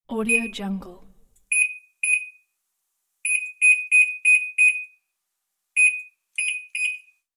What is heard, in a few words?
barcode scanner